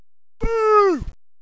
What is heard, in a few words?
boo